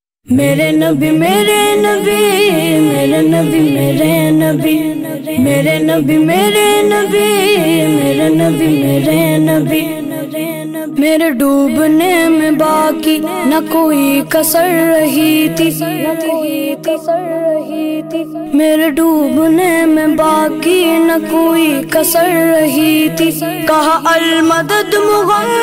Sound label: Naat Ringtone